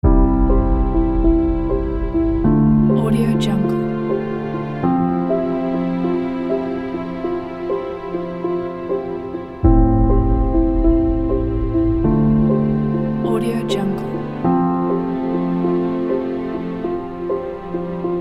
piano atmosphere

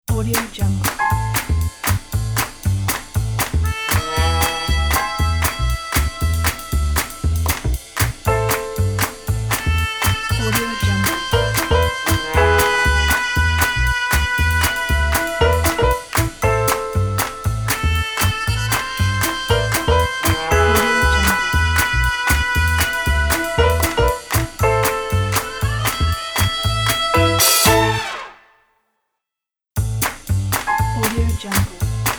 uptempo jazzy groove

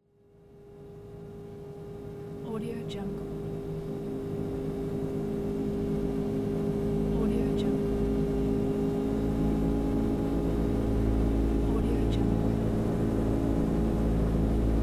airplane

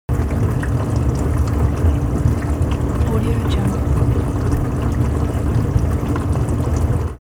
object household dishwasher running close

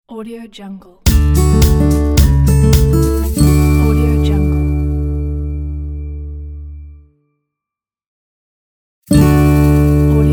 acoustic smile logo; abcdmusic

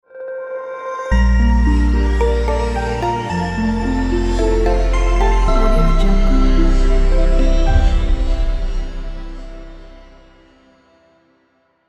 positive bright light logo

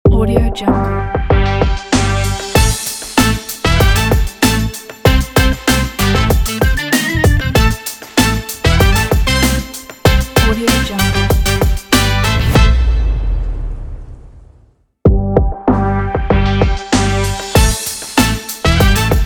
funky logo

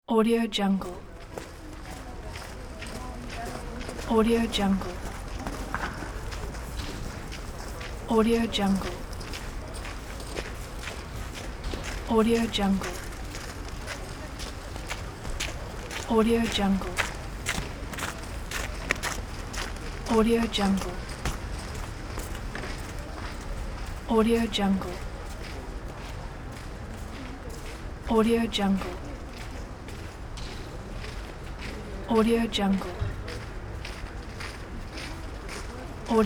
pedestrian street

